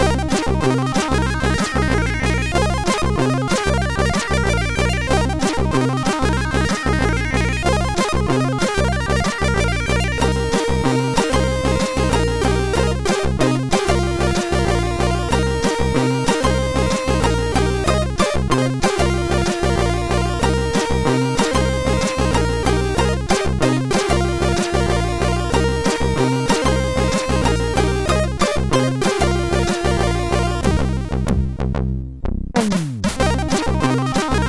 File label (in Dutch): Muziek, MOS6581R4